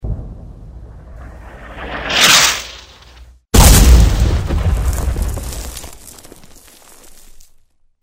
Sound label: mortar